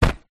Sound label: paper bag thick set down on table